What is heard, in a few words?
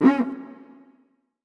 Open Cuica, percussion reverb; MARKYDRUMS